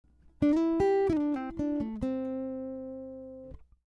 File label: guitar slides phrase